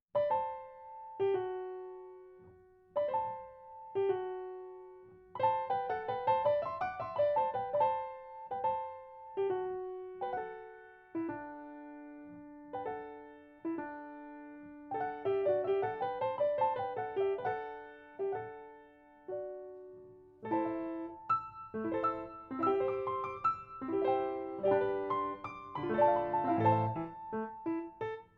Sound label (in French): Menuet; Debussy